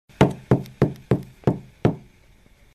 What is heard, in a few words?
Club; چماق